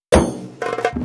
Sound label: Fill 128 BPM; Vengeance Samples; VEH1 Loops